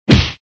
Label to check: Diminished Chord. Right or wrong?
Wrong